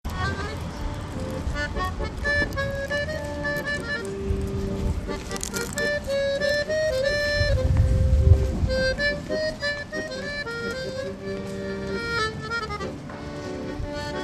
accordeoniste

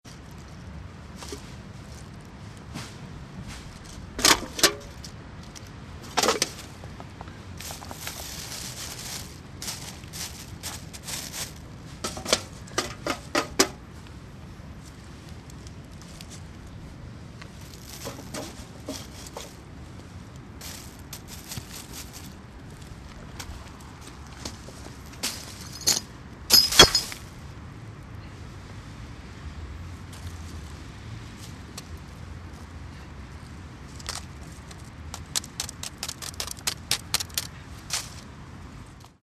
Dechets

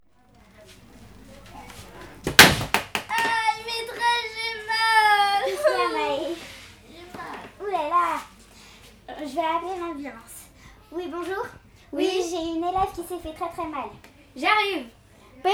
theatre

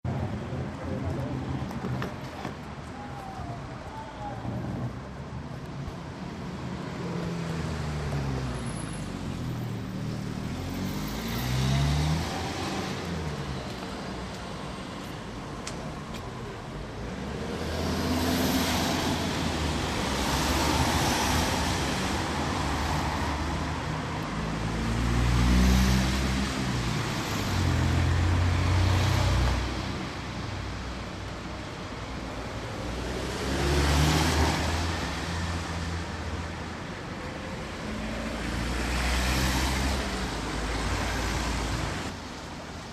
la circulation

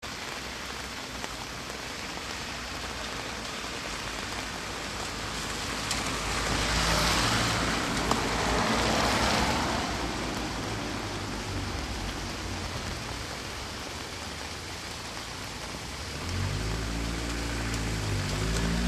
la pluie sur legumes

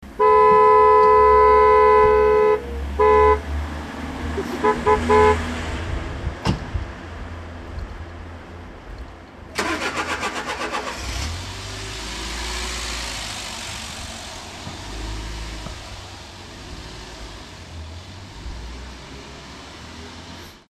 les voitures

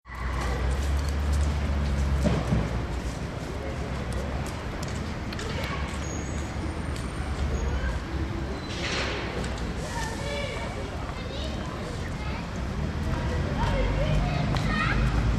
circulation

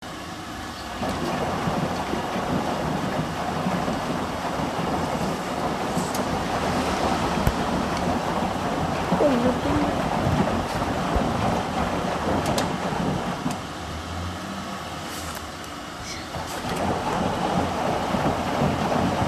lavomatic

